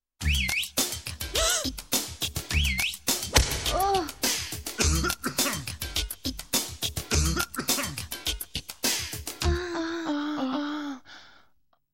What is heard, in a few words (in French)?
fouet